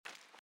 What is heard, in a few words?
armour Light Run; out of reach treasure royale 2020 gamerip pc